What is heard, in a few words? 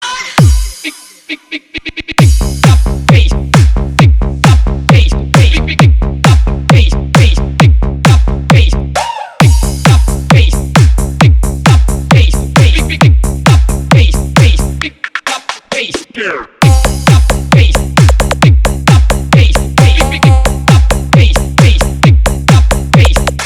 Powerful Boom Bass Beat